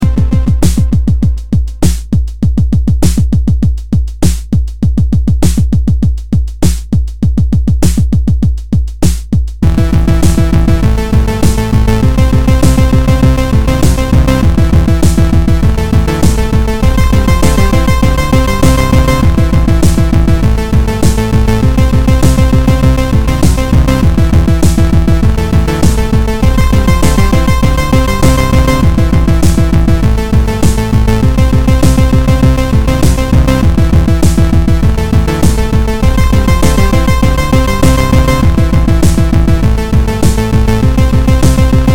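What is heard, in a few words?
Industrial Intermission, game music; Project Warlock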